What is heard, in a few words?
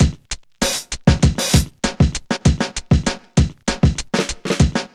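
108 bpm, Funky loops; Zero-G; Funky Elements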